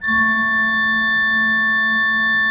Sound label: A2, Cathedral Organ; Propeller Island; MIDI-ORGAN A